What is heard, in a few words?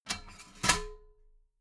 metal ladder small handle fold soft